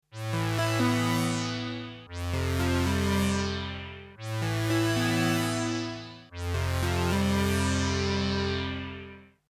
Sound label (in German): poly d zerlegter akkord